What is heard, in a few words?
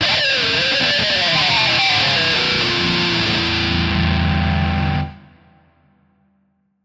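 Electric Guitar Plectrum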